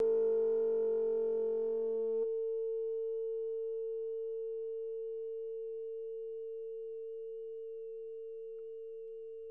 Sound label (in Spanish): diapason; Durante la grabación no se ha podido evitar la inclusión de otros sonidos provenientes del exterior.